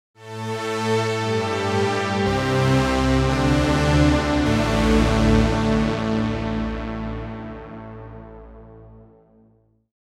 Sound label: pad; opxpro2; Riddle